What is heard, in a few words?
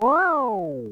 Mushroom Shrink